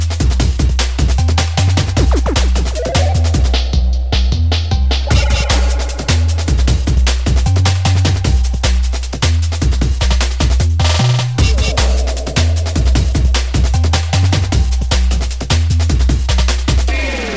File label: jungle